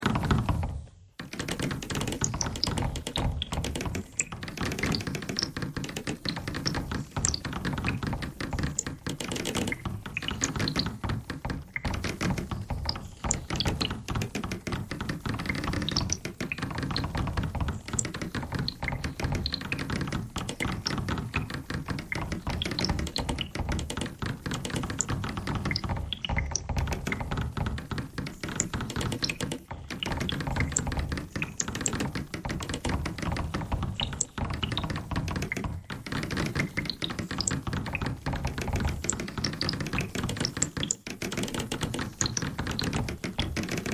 bagpipe thread noise

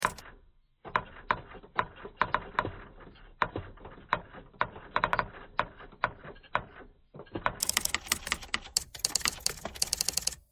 typewriter thread pentatonic

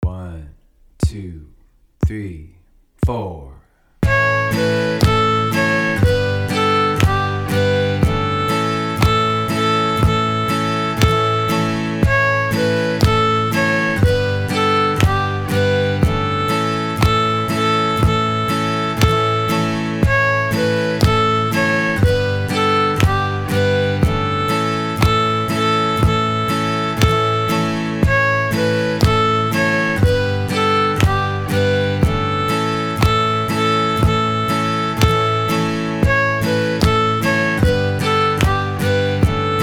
Old Joe Clarke Intermediate, fiddle

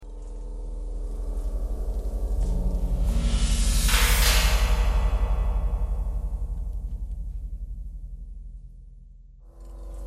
knocking thread noise

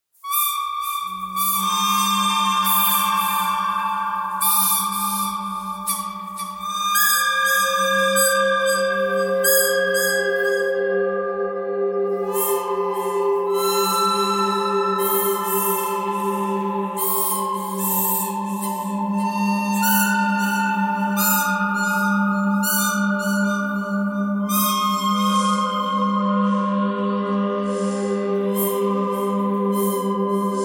bird birdcanyon thread pentatonic all; birds

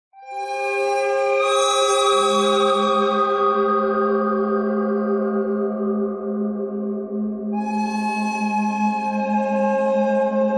bird thread simple

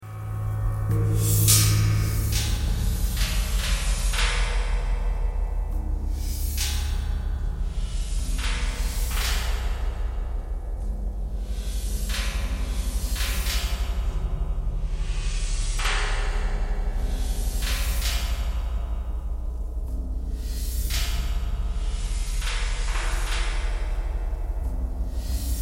knocking thread noise all

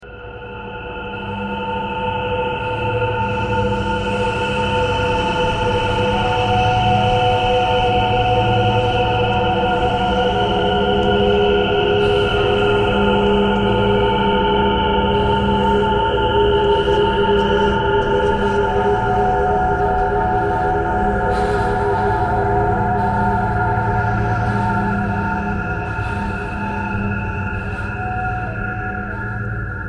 thread noise

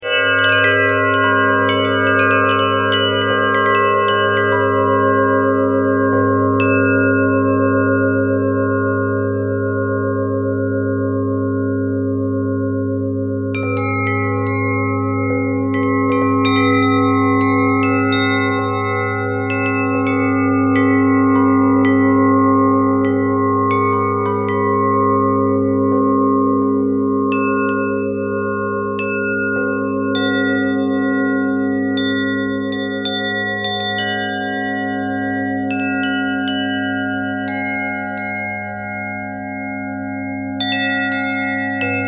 bell twist

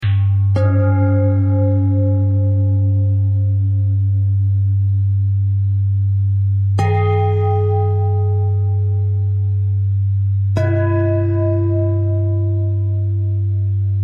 bell thread pentatonic

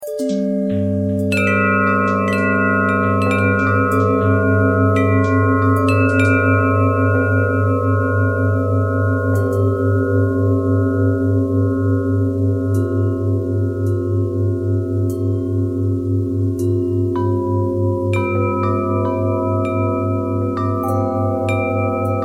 bell bell thread pentatonic, bells

